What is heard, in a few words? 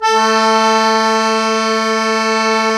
KEY Cajun, Accord Trio; Club-50 - Foundations Roland; Accordians